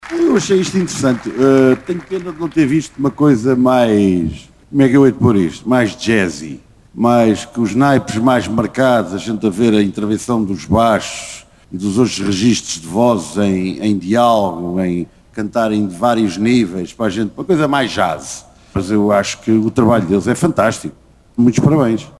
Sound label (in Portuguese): Coro Mozart